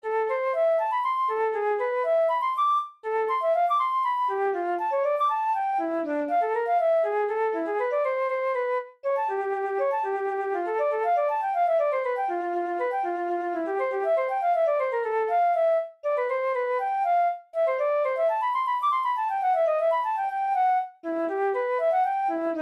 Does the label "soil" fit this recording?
No